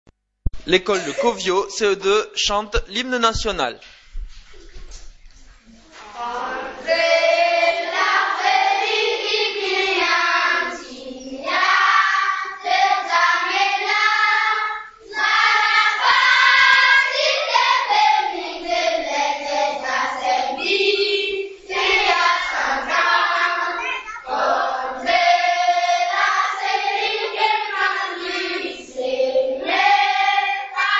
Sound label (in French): hymne burkina